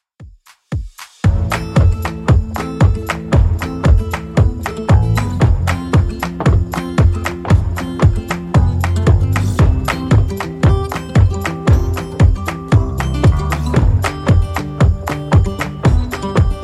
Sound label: Top40 Redrum 115bpm